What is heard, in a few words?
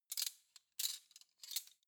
cutlery spoons handle soft